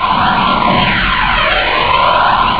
pull; Hexen II; famine

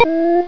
Blip Woo

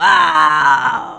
death; QUAKE2; female

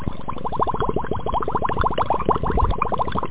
blub; SHARKS!

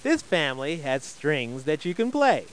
string; My Science Fair

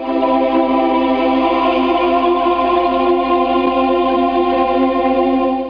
Soar Note; Museum of Anything Goes

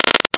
Crack; Grid Warrior

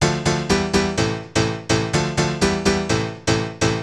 piano house 125 A; eJay; Keys

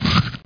CRUNCH

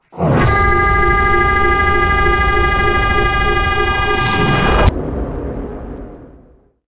UFO VAPOR, disasters

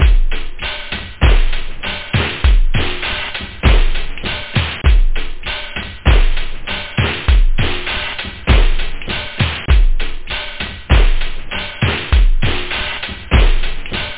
basic, loop, house; da capo vol1

